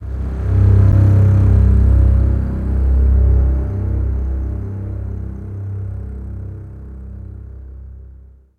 Success Stinger

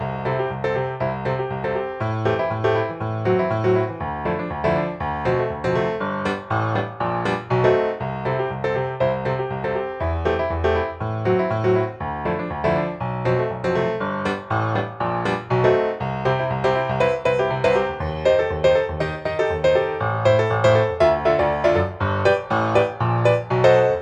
MUSIC PIANO; HUB WAYNETECH; Lego DC Super Villains 2018 Nintendo Switch

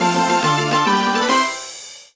Jingle Fanfare; let's draw; nds

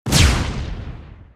Grenade; Alliluah

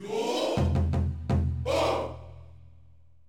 Jingles

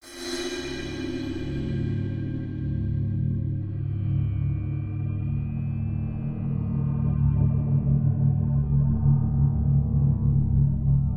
Ambience Horror Dark; Fienup

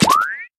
UI Enchant; Inotia 3

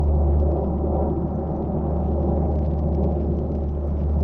Flying Far

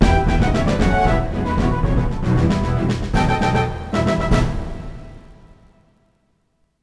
Duel Music Lose

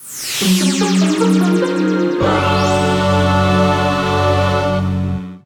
Goal Fanfare; crash bandicoot series sound box; Uranus' Mine; 3rd Place

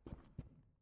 power out step; Five Nights at Treasure Island; windows

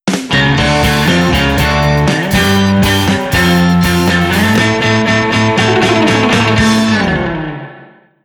Sting Win (D)

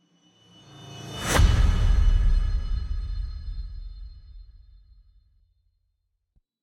Boot; F1 2016

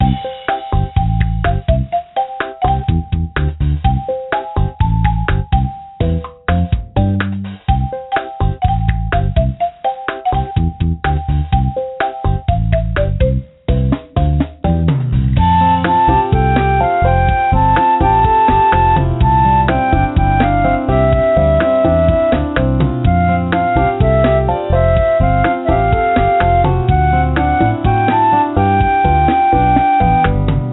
BGM Room Nursery; Real Stories My Baby World; NDS

